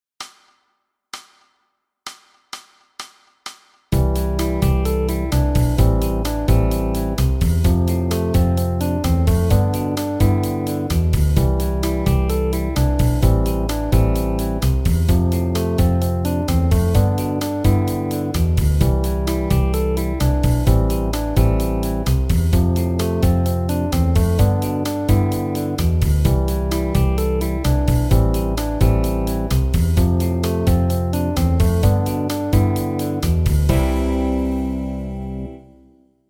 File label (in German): Strophe, mit Bass